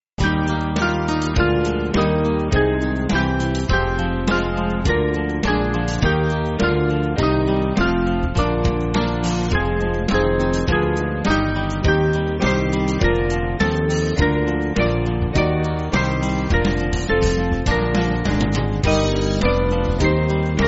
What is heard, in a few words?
Band